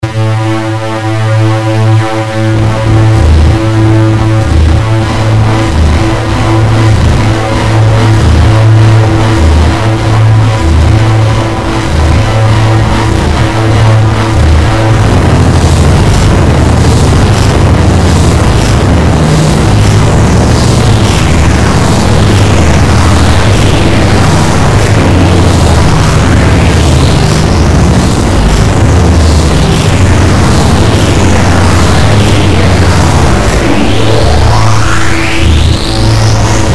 distorted noise

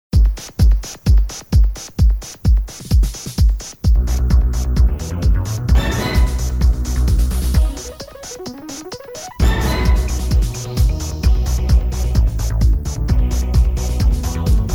disco

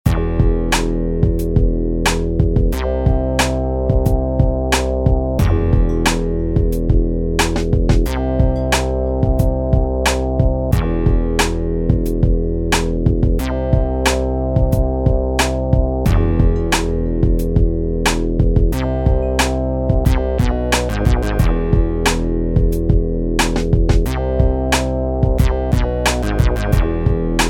lowbass instrumental

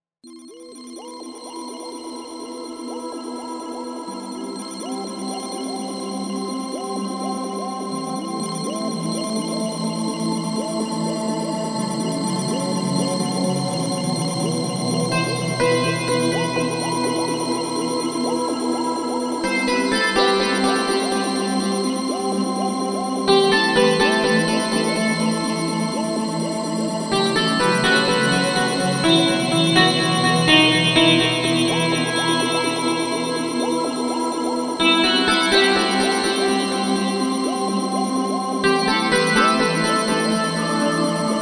piano

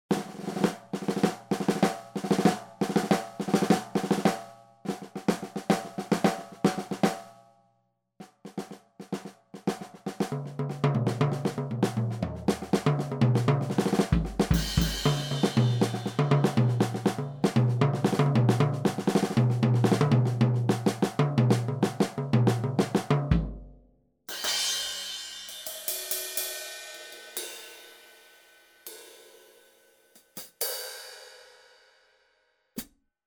moon jazz solo

